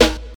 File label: puffy acoustic snare a key, acoustic snare sample tuned to the A note